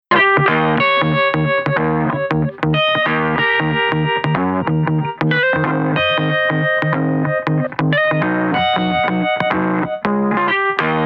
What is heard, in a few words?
e-guitar